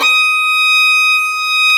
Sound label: violin D#5, Orchestral; Violins